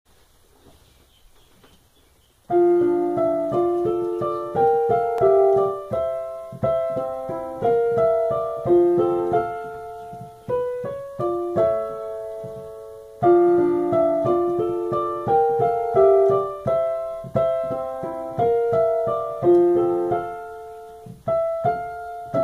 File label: Piano; Serial Experiments Lain PSX Main Theme